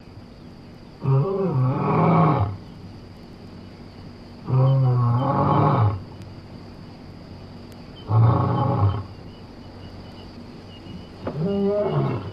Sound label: Lion Growl Open Field; big cats